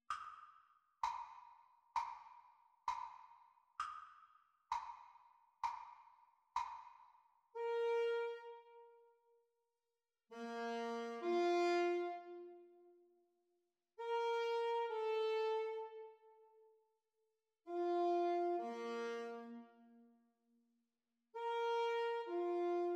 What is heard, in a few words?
Sax Duets Playalong; Practice Speed